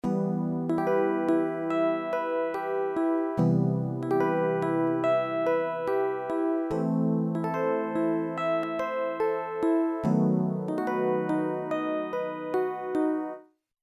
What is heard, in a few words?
rhodes, kawai k1r